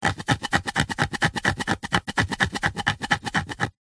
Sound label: foley wood long branch pole scratch fingernail